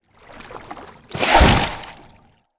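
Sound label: kraken leg attack, monster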